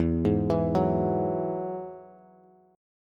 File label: E Major Add 9th, Bass; arpeggiated; JGuitar